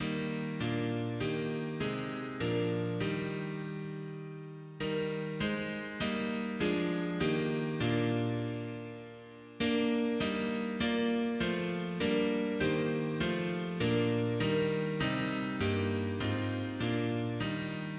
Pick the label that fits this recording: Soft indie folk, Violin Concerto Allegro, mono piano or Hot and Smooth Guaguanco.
mono piano